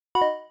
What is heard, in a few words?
Popup Menu; sao utils 2 windows gamerip 2022